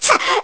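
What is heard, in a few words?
Hurt Burn, gameplay sounds; Link; zelda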